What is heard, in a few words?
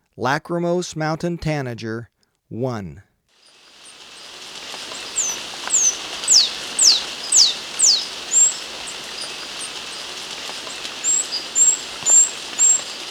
Lacrimose Mountain-Tanager; S. America